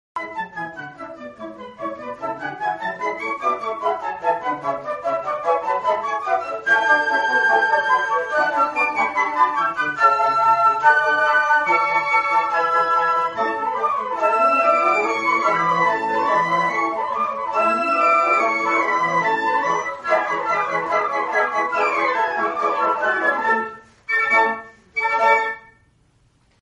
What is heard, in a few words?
new year consert, flute